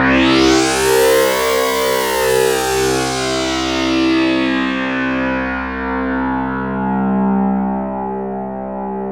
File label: SYNC MOOG SWEP, MEMORYMOOG; Zero-G Ambient 1